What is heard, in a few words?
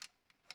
recock, guns